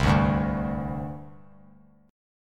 C Suspended 2nd, C, down, chord; Csus2 strummed

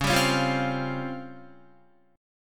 Db Major 7th Flat 5th, Guitar; DbM7b5 strummed; JGuitar

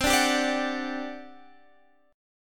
G Major 7th Suspended 4th, C

